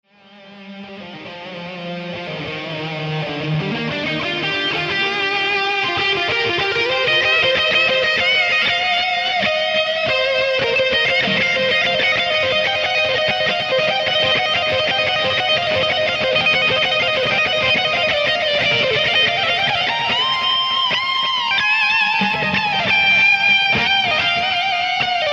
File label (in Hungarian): gitar; Roland VG88